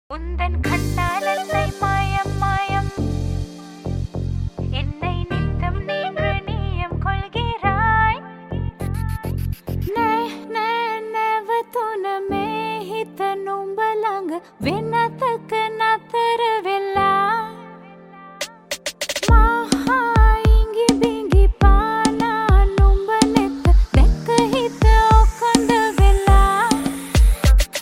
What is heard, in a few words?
Tamil Version